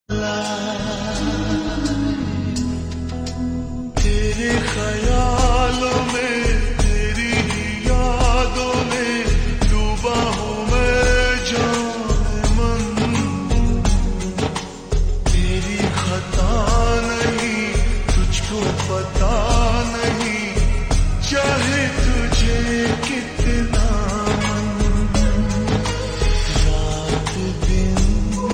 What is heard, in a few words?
Ringtone Slowed Reverb